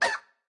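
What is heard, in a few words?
Chicken baby attack, 初级形态攻击音效; 母鸡会用自己的喙不断地啄对手; 绿野世界; 卡通农场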